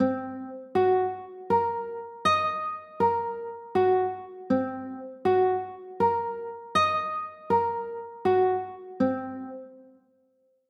c m7b5, chords

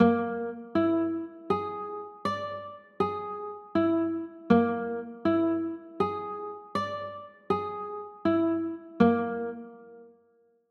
c sharp 6 9, chords; c 9 sharp 5

